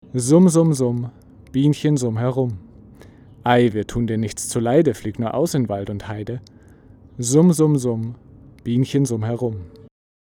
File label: Isovox Mic se Pro Screen Vox 15cm Background Noise